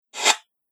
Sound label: Rough Swipe, whoosh